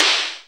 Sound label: Snare, Akai MPC-500; Toolkits; Snare TKit